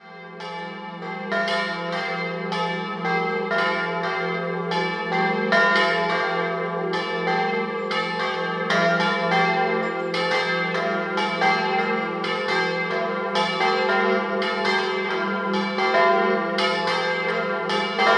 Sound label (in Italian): campane